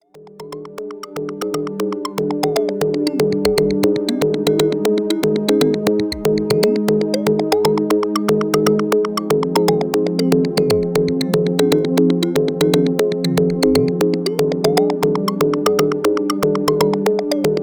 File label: pro1, synthesizers